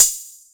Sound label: Roland TR-606 Hat